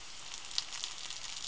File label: swap